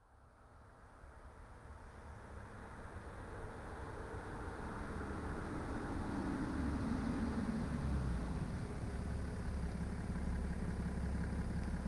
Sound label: bmw f12 640d gran coupe exterior wet asphalt stopping from 40kmph and slowly drive away; fvtt sonniss gdc audio